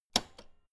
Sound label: old button press jump out fast